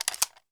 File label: mp5 stock